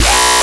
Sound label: bass